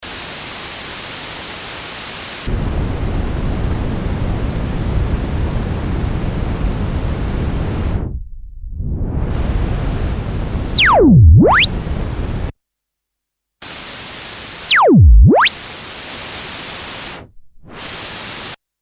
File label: white pink noise patchbay, korg ms20 synth